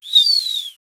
whirling gust of wind, breeze

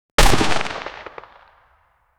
cinematic sfx of an arche, archery

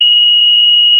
military notification sou; alert tone

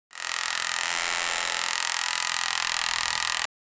panel buzzer sound, buzzer

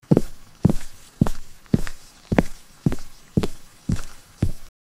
footstep sound effect

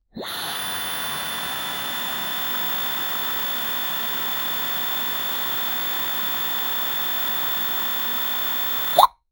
cartoon vacuum suction

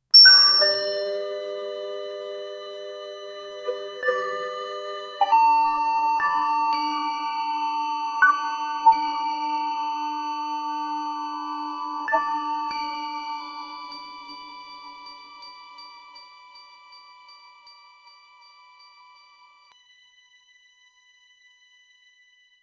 lg washing machine end of

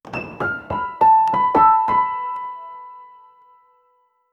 short cinematic music; documentary

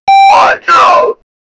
wrong answer sound, feedback

